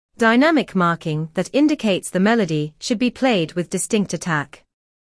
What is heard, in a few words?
marcato